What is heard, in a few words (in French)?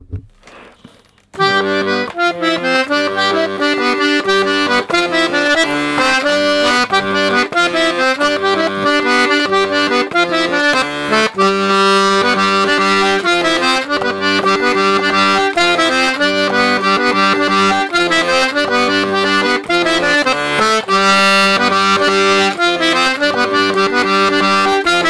Gigue a 4 doigts